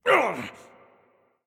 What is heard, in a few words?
hitreact human male small